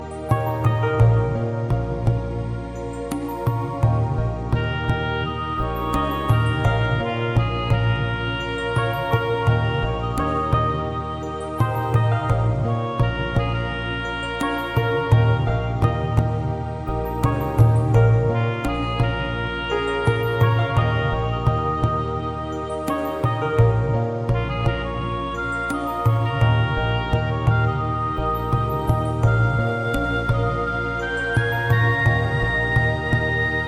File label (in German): Gong und Bewegung nach allen Richtungen